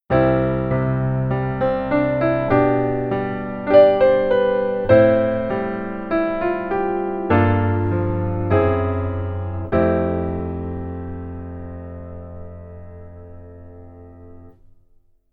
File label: Keyboard